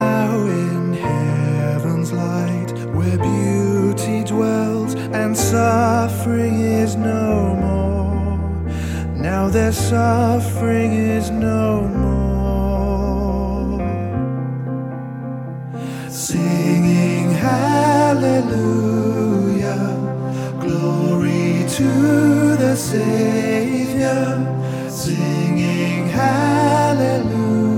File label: Singing Hallelujah